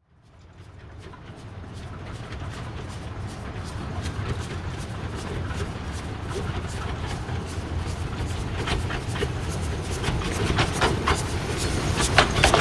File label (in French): trains a vapeur a voie etroite; sonotheque